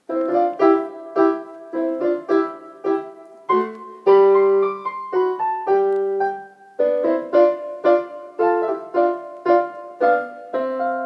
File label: piano solo